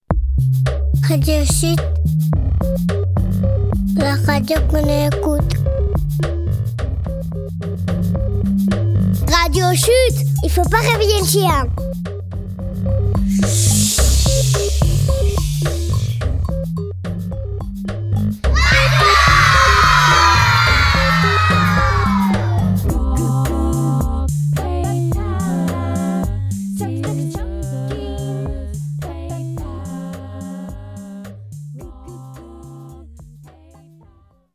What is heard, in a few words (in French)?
Radio Chut Jingle